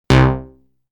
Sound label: Multiwave Lead, synthlead